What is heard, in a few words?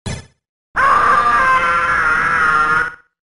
Scream; Home Alone